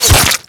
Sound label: Bullet Flesh; Warframe